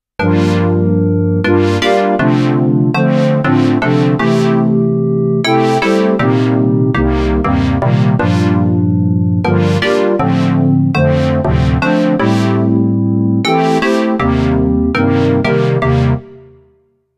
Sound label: KORG Odds Hybrid; prologue